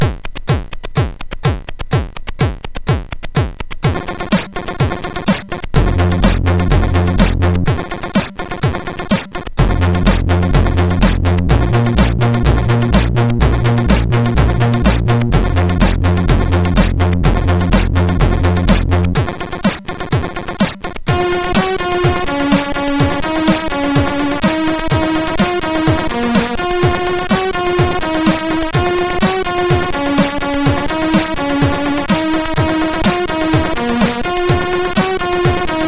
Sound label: tune sid MOS6581R4